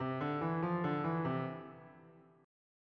C-Major Invention; Bach

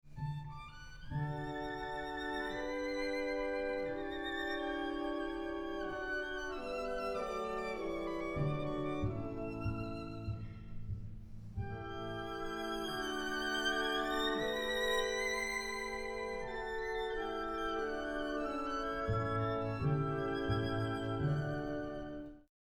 violin; beethoven

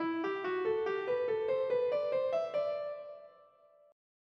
C-Major Invention; Bach

